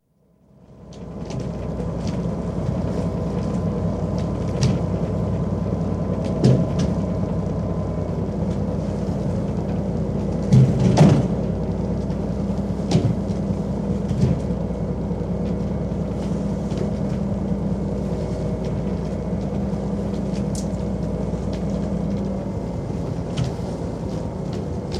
Beam Fishing Trawler, general atmosphere on deck whilst trawling; Boats; BBC